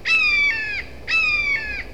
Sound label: red shoulder hawk